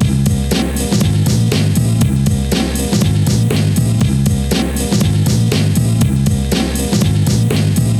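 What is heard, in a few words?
120 Pop Combo Beat C major; Digidesign; Pro Tools; Pro Tools Essential Loops; Pop Rock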